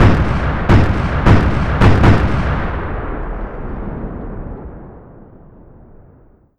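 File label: cannon sequence heavy filtered; Explosions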